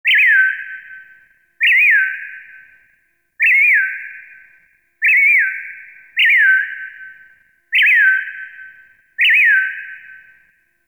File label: Terrarium Bird Chirp I; Warframe; Ambience; Entrati; IntTerrarium